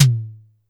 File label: Drum X Tom; Pearl Drum-X